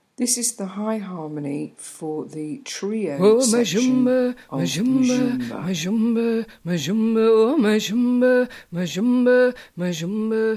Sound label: hi SOLO line